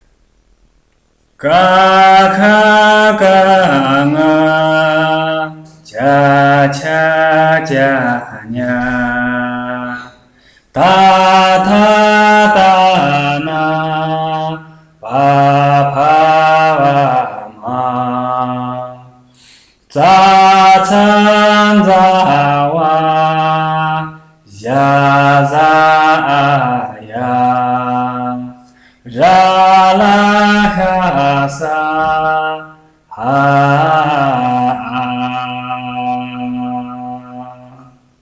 Singing the 30 Tibetan Alphabets; Gyalwai Nyugu

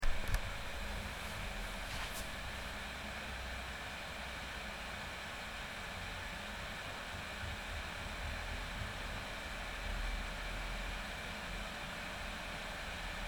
hover sound

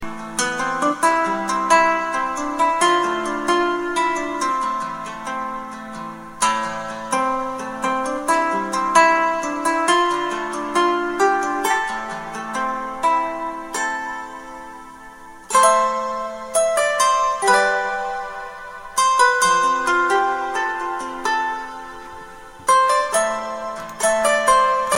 sound pressure level guitar

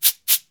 bip

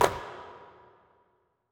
sound fx end

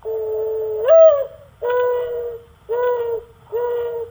Dove; woods

